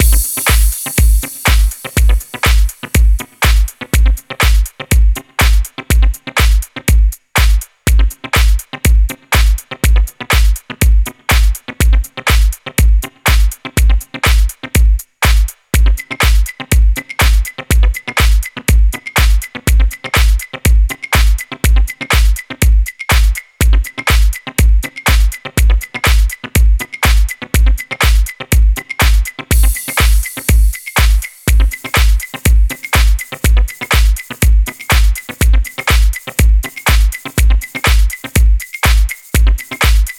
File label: Dub Mix; Techno